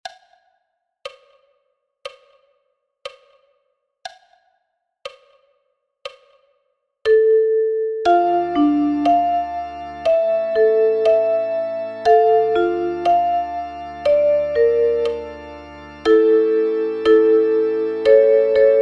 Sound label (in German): Go down moses, Duette für zwei chromatische Mundharmonikas; gospel songs